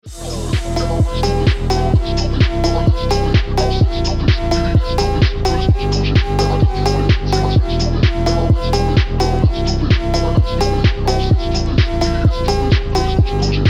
Phonk; Ringtone

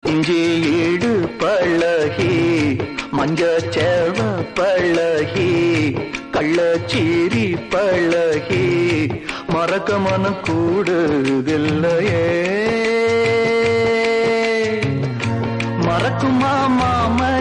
Tamil Song; Thevar Magan

